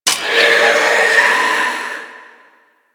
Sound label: Grimoire Vulnerable Impact; Entrati Doppel; Warframe; EntratiDoppelganger